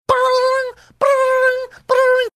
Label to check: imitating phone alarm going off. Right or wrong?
Right